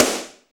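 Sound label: snare drum, dance kit; 90 s Sample CDs; Sound & Vision - Gigapack I CD 1 (Roland); Dance-Kit 14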